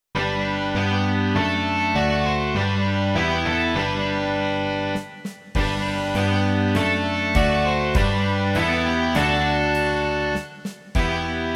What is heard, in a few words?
rock